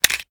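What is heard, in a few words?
pistol reload fast xmag mag out; gmod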